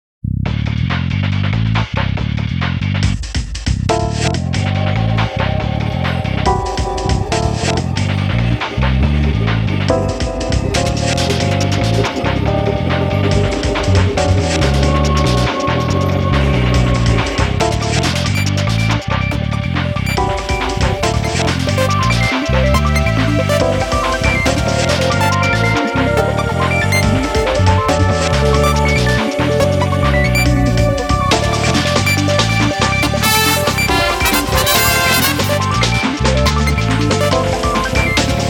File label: DnB; machfive; al Pesto; Motu